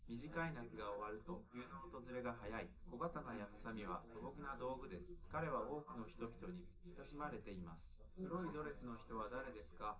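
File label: male, live recording 250ms 1m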